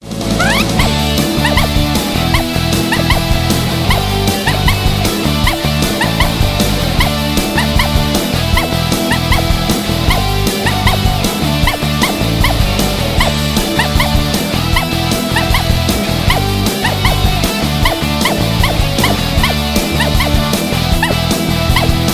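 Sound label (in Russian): милая маленькая молочная собака кричит быстрый звук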